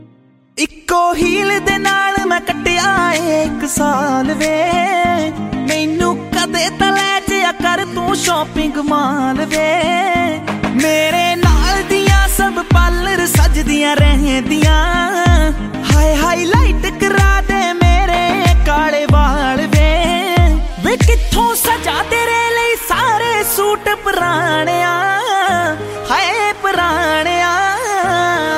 Punjabi Song

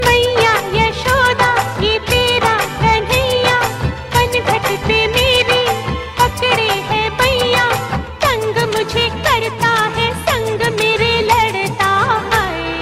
Hindi Song Ringtone

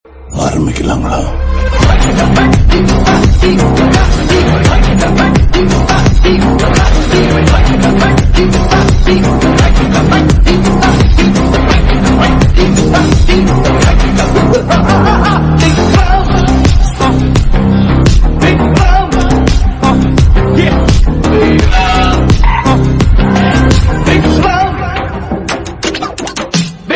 BGM Ringtone; Vikram Movie

